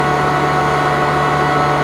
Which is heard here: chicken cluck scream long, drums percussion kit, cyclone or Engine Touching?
Engine Touching